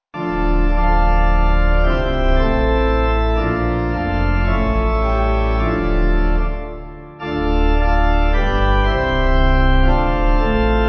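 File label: Pipe, Pipe Organ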